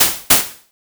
noise Synth

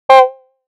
duo Synth, tone

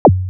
membrane Synth

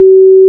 frequency Shifter

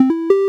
synth melody

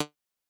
pluck Synth

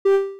fm Synth

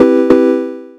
poly Synth

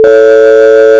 pitch Shift

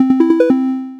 poly Synth

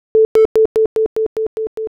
ping Pong Delay

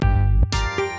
stereo Widener

